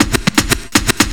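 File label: scratch, record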